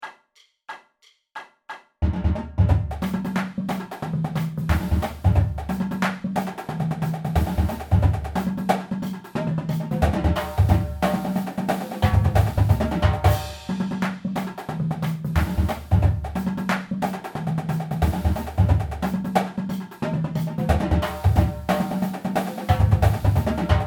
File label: Blowdown Drum